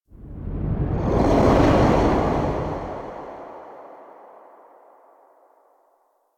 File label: Transition Whoosh Enter; Warframe; Quests; Sacrifice